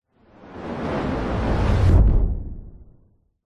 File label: sound design cinematic building powerful whoosh into dull boom hit; zapsplat